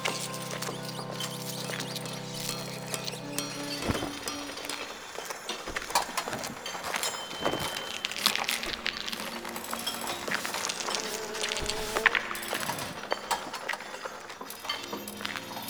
Town Foreground Amb Loop, ambience; Duviri

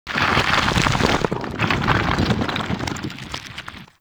Reverse Rock Smash; Corpus Ship Remaster; Purgatory

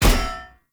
Sphere Polearm Medium Impact Armour Solid

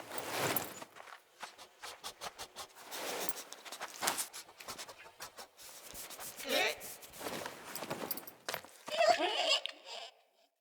Beaver; Encounters; Animal Rescue

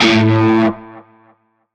Shawzin Oct One A; Warframe; Narmer Shawzin

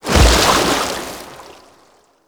Tidal Wave End; Warframe; Pirate

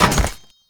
Generic Ledge Grab; Warframe; Characters

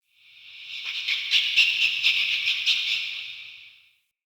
Ghost Rat Chatter; Ambience; Soul Plane; Witch Station Ruins

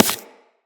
Laser Impact Generic; Weapons; Bullet Impacts; Surface

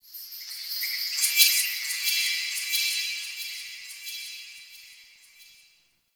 High Texture, Ambience; Warframe; The New War; Zariman

